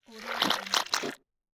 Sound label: fleshy transformation